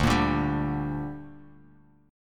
D Suspended 4th Sharp 5th D down Bass Standard, chord; strummed